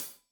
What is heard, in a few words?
Hat Tight, Hi-Hat, Cymbals